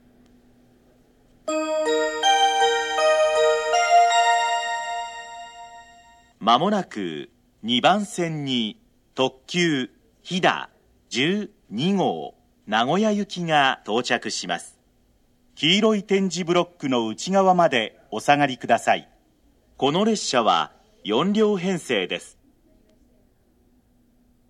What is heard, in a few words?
limited express; jr central; gifu; hida12